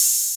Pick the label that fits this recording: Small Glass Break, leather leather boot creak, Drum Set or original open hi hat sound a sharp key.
original open hi hat sound a sharp key